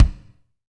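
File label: BD, bass drum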